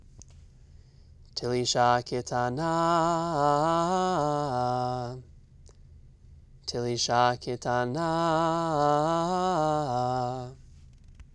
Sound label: telisha ketana; Trope Families; trope exercises